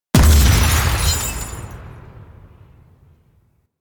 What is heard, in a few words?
Glass Shattering Ring Destroyed; Warframe